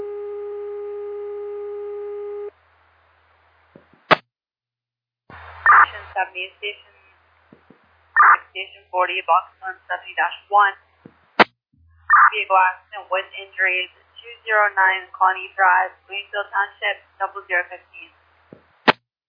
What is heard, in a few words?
page received